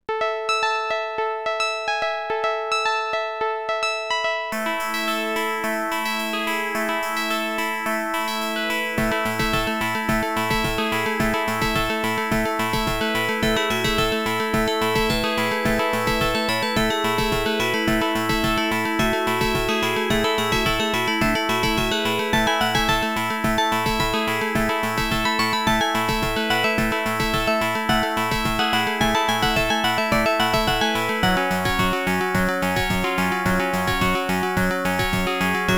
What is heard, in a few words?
ADLIB OPL2